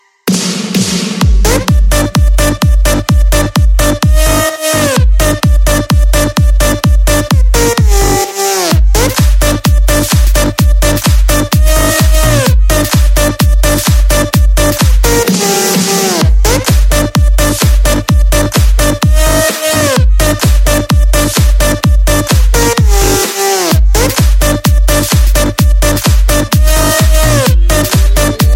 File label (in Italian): Musica da Giostra